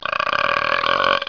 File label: Open Drain Jr